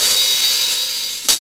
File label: subtle open hat a sharp key, open hat one shot tuned to the A# note